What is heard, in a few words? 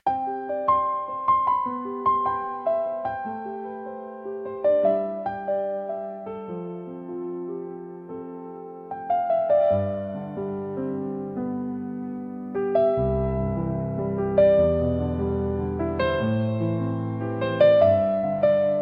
fantasy music nature forest